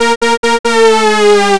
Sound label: airhorn